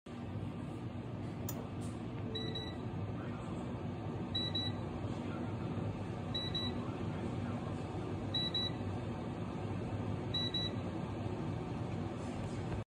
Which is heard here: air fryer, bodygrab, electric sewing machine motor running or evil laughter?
air fryer